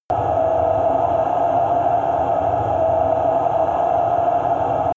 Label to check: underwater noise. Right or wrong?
Right